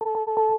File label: warning, sounds; dragonpilot; selfdrive